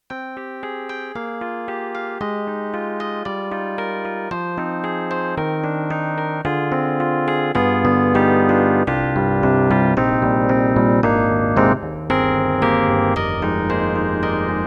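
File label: YAMAHA MU1000EX Brooklyn, piano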